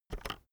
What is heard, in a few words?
keyboard external enter press fast soft